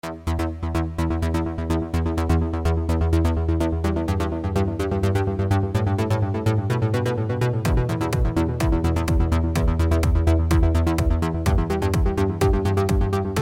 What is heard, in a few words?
Background Music; Cut Scene02 Background Music; Itadaki Smash